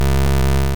Sharpen; sfx; attack moves; pokemon; gen 1; rby

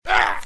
Enemy Hit; Arcane Quest; android game

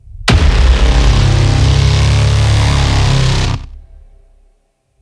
Hit; Monitor

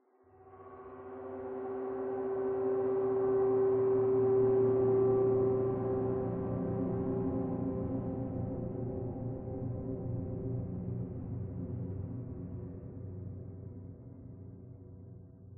Interior Metal Drone

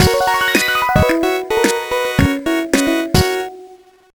Promotion; the urbz sims in the city; gba

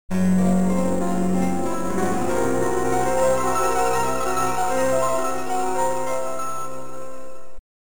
Play The Organ

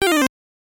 Incorrect; Super Jeopardy; NES

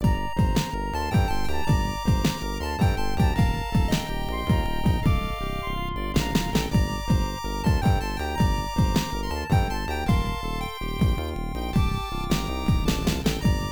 Shuffle Stage; The Magic School Bus Space Exploration Game; Genesis

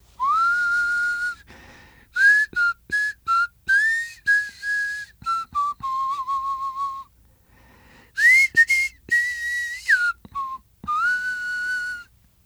male whistle; lucius

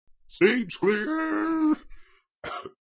stage clear; Doeo Online

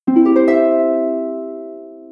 Reward; Toy Story Mania; iOS gamerip

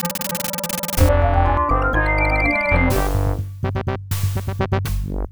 Falling Down

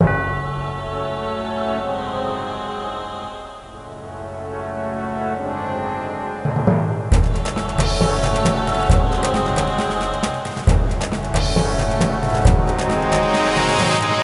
Imperial Battle; Lego Battles 2009 NDS

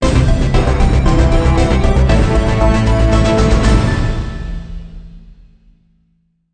Sting Game Victory

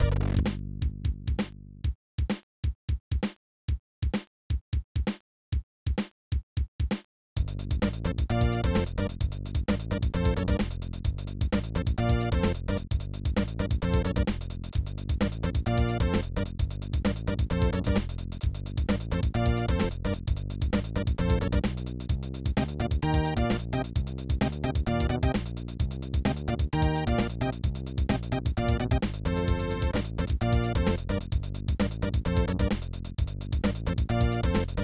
Gene Funk, Roland; Blake Stone Aliens of Gold; MS-DOS

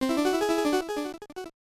Jingle - Enter Room; Kaze no Klonoa Moonlight Museum

